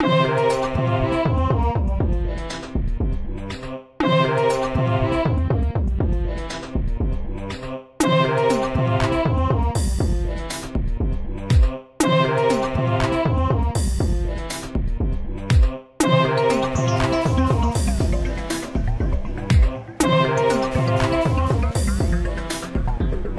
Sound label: 12 string dream; GarageBand/Logic Pro X Project that ONLY uses the '12 String Dream 09' loop as the sole content of the work